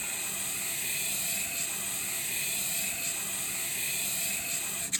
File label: scratch